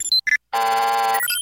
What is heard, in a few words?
Door Compilation